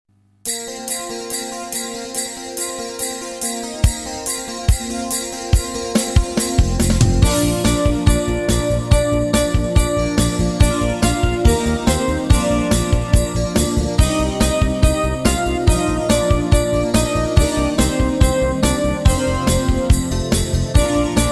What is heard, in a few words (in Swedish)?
Bjällerklang snabb; trumpet